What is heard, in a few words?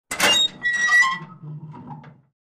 Sm Heavy Metal Door, safe